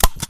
Stapler; friday the 13th killer puzzle

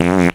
Fart; Nicktoons Nitro; arcade

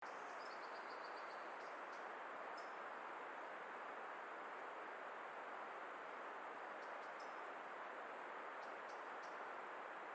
ambient wind and bird

